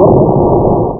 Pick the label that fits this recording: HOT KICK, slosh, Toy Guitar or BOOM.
BOOM